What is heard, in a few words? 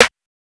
Snare